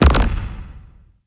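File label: explosion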